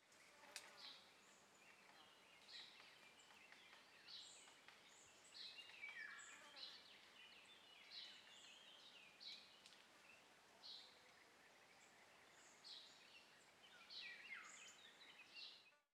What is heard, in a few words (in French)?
Très Ancienne Carrière Oiseaux Pic Tape Brindilles Moustique